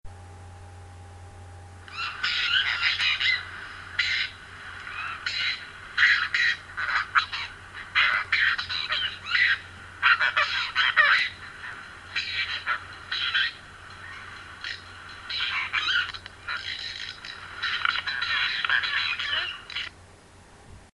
Apostlebird

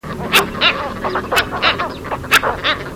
Ardeola ralloides (song)

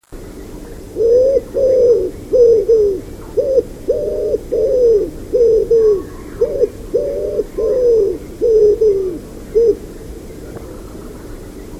Columba palumbus (song)

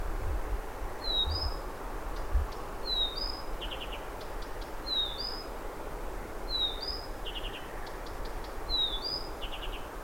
Blyths Reed Warbler

